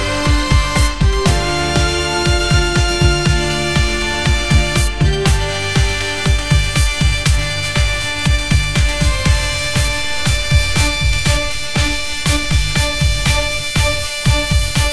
epic pop symphony rise, music; ai

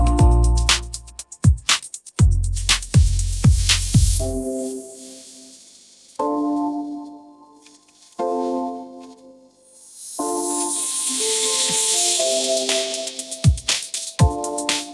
sunshine groove pop instrumental, ringtones; ai-generated

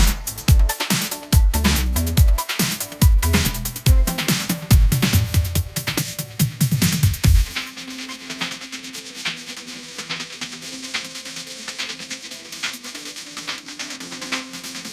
shining lights dancefloor, ringtones

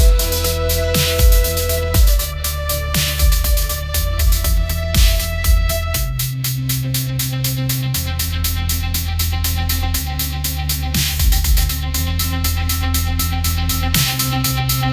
bright synth pop groove

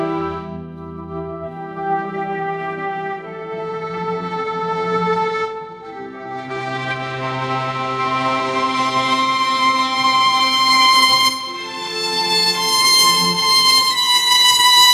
elegant hymn for strings and piano

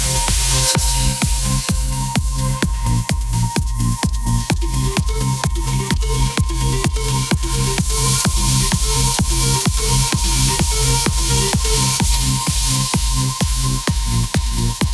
bass drop universe, ringtones

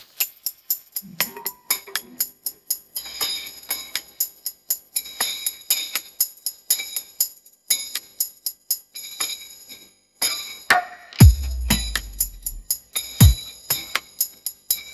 morning pop

